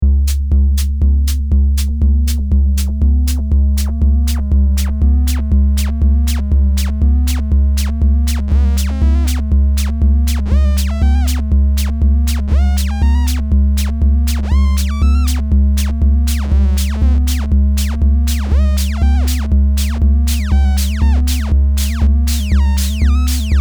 Filter Resonance Modulation; L.E.P. LEPLOOP V2

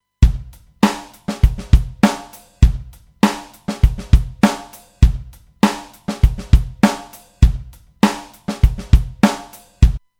Drums SPL

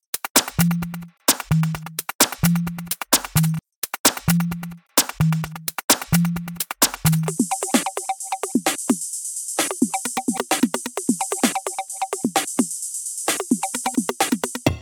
Electro Loops

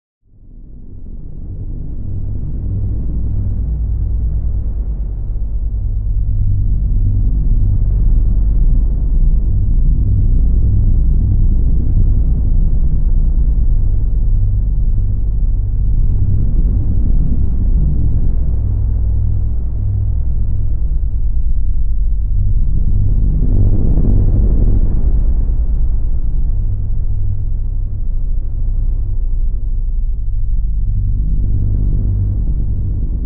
Deep Noise with Novation PEAK

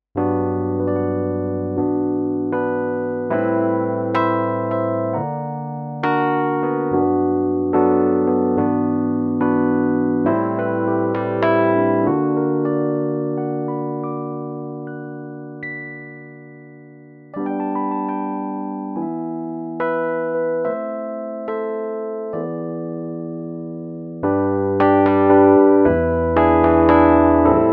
Rhodes

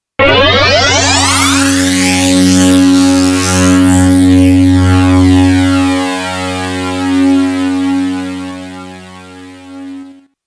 Osc vs. Filter; Pro-12

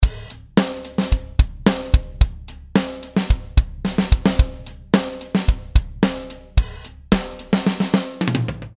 Loop pur

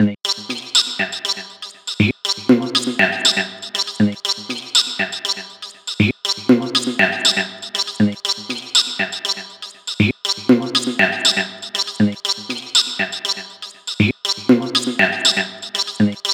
XLN Audio Life Vocals

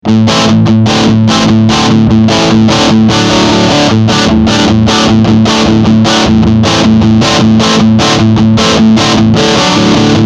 FLEX BOOST

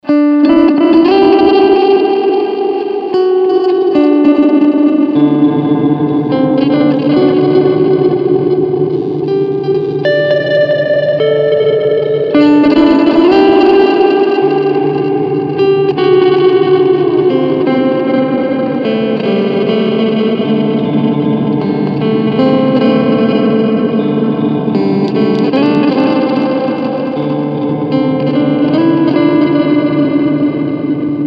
Soundscape

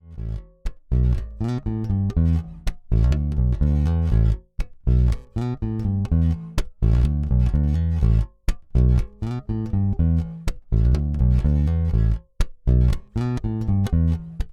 Precision Bass Pickup aktiv boost; Fender Troy Sanders

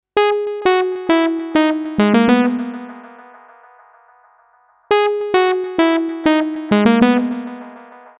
Mayer MD900 VA Shaped Korg35 LP

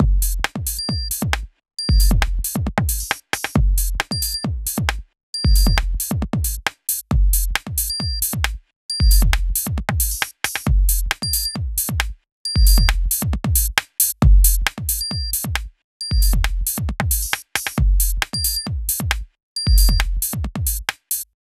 Hitsville EQ Drum EQ

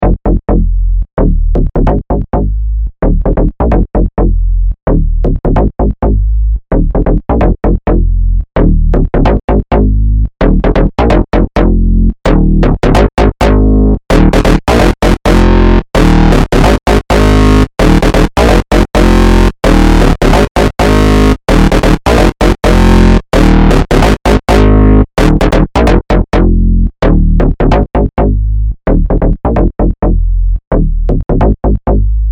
blue bass lead

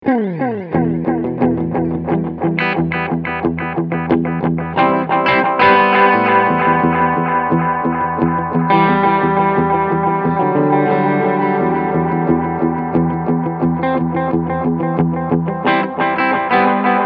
Echorec Medium Delay

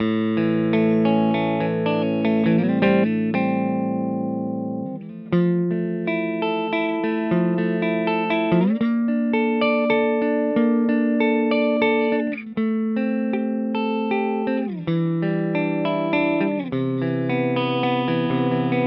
Maybach Lester Junior Double Cut Both

